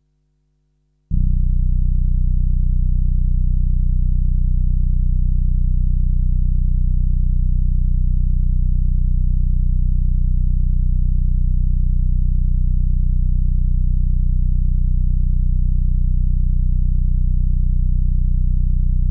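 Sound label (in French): infragrave nappes statiques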